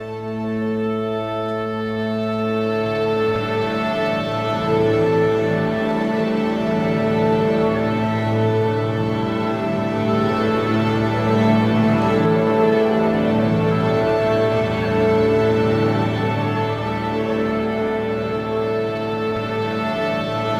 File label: Orch Tuneup; Looney Tunes Cartoon Conductor; Nintendo DS